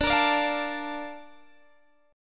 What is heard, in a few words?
A sharp Major 7th